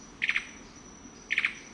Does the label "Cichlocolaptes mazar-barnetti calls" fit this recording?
Yes